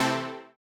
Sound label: Housey Stab C3; KG MegaSaur